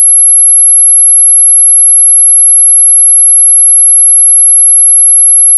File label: tone 11080Hz